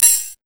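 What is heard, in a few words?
hi-hat open, Big Beat Samples; Big Beat Samples & More; AKAI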